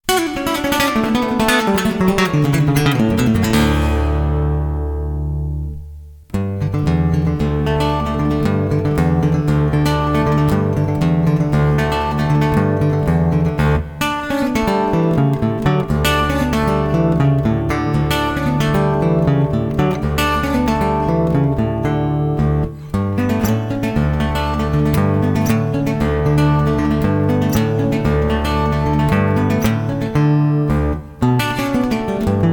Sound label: Acoustic African style, Guitar; Guitar Techniques 2019 Issue 296 June